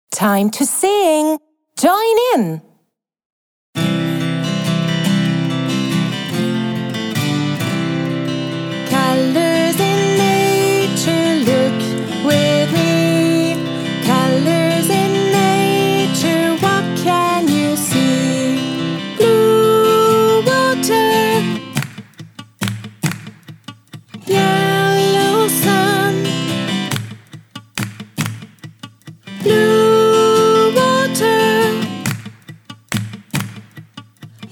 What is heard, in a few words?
englisch song colours in nature; spatzenpost; Schuelerzeitschriften